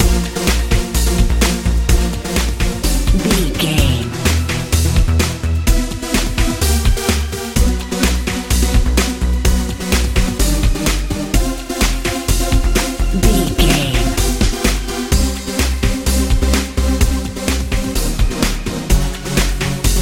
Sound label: hot sunny modern pop